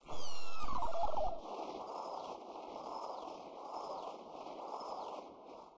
dash, enemies; fowl-play; boreass

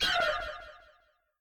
death, player; fowl-play

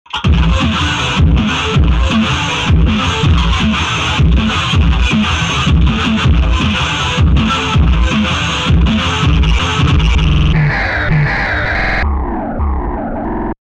game over song; fowl-play

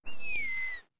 feather; weapons; fowl-play

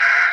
woodpecker; fowl-play; enemies

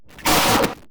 explosion; fowl-play; slingshot

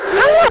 pounce rev; fowl-play; boreass; enemies

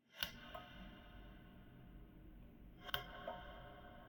light switch; fowl-play; poultry-man-menu

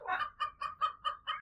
chicken; fowl-play; enemies; hennifer

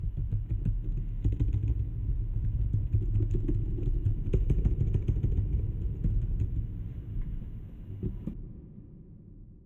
typing; fowl-play; poultry-man-menu

